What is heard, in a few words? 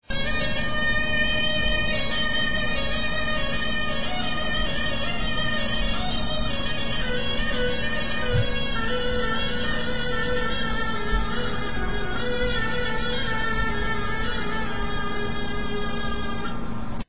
mizmar; Etnologia